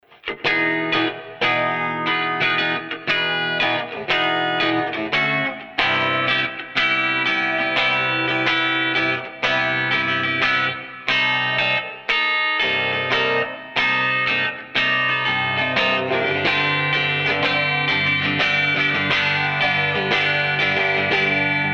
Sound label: 670 Thres TimeConst; Jack Joseph Puig